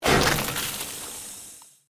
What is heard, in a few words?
Created Magic Spell Ice Bolt